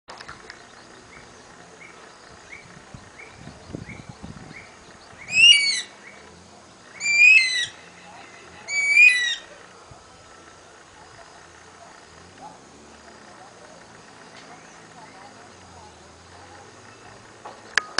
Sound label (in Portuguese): Anu Branco